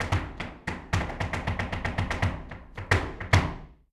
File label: Flamenco Tapping Zapateado Solo